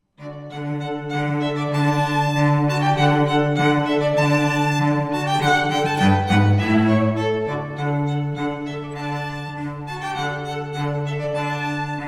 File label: String Trio; Vivaldi Spring